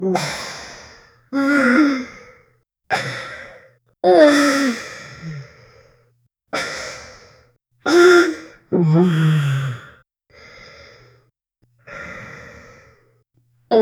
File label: 18 years old man holding, close mouth